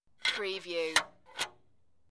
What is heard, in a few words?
Metal Latch In Out